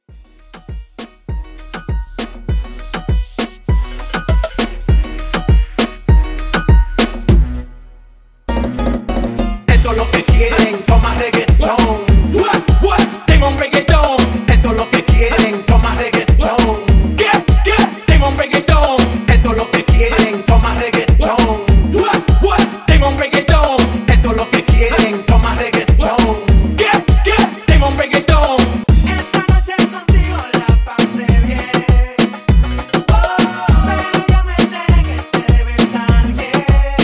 Moombah Hype Edit 100

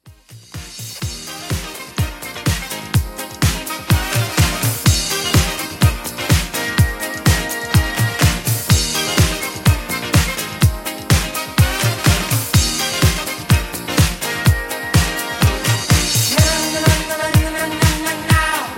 80s Classic Mix 125 Bpm

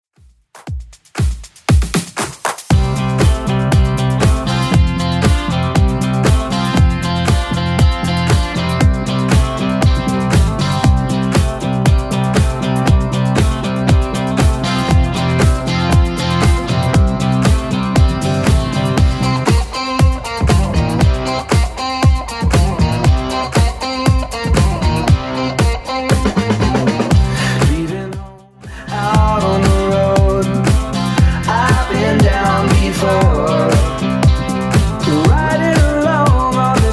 70s Club 118 bpm CLEAN

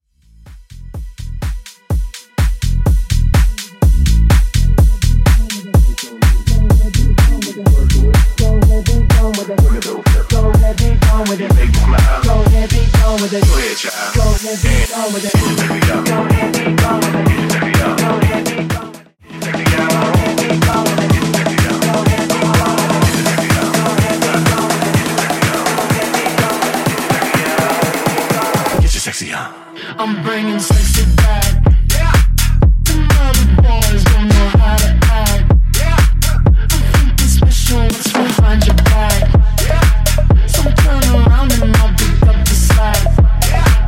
Bass Mix 125BPM

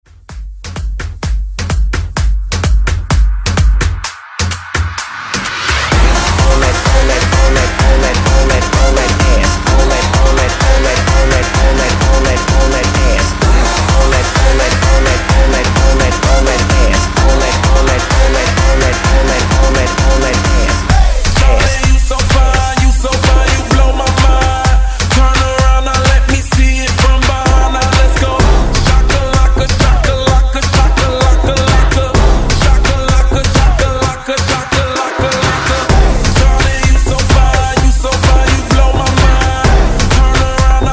128 bpm; DJDOLLS

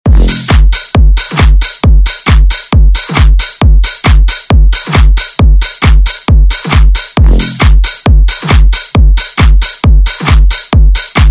Dance Redrum Intro

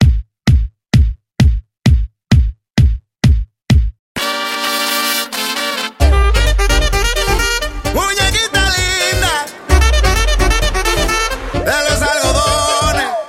Bounce Mixshow Vocal Mix 130 Bpm

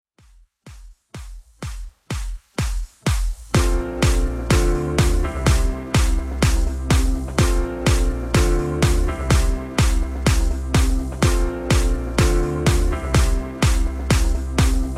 Dj Intro Outro, 125Bpm

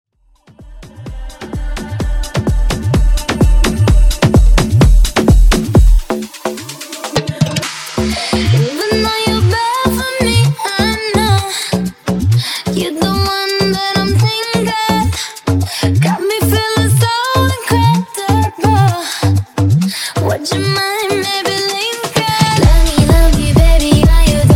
Tribal House

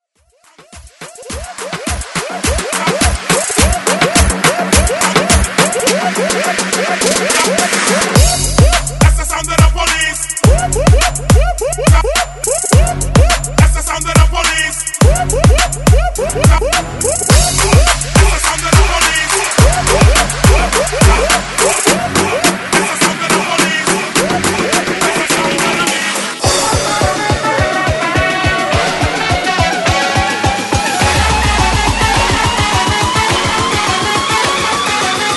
Moombah Twerk Bootleg

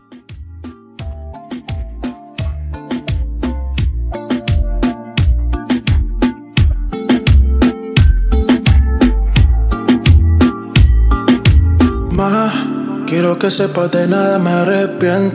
Reggaeton 86; Club Edit

